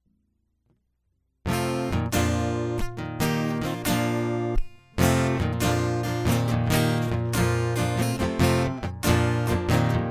Guitar Prelude

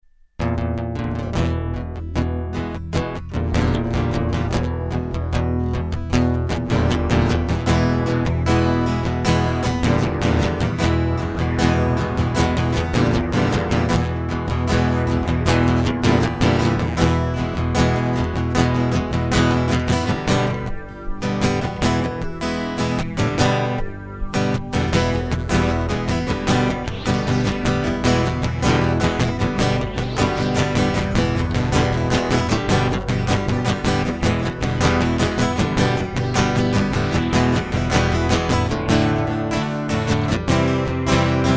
American Rag; Interlude; hyper terrestrial

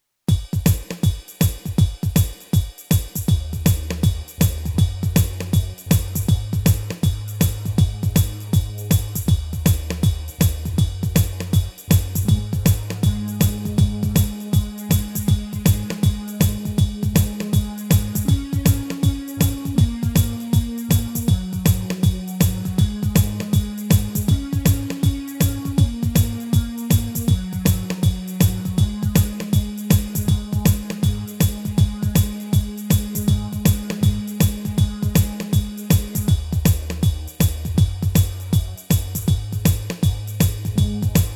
Instrumental

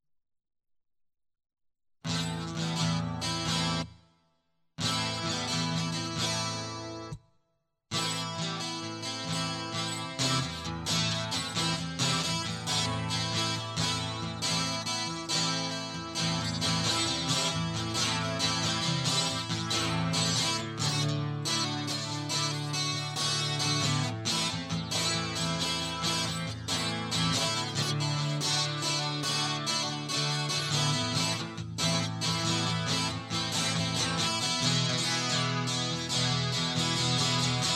Guitar Loop

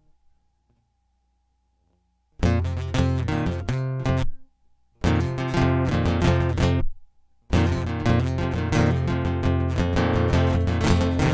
Guitar Loop

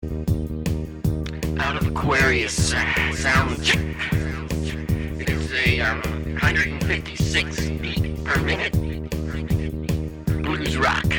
Soundcheck

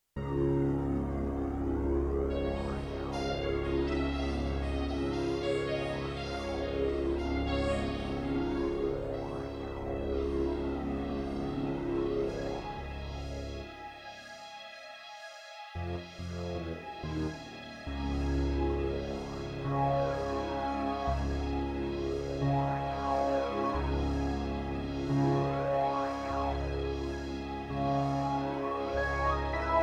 Cha Cha Cha; hyper terrestrial; Incomprehensible

